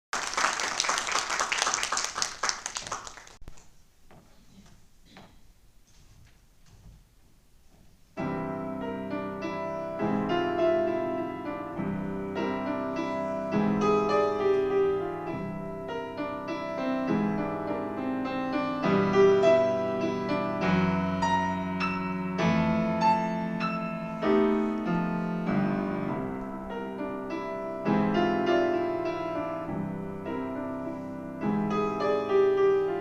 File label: Prelude for piano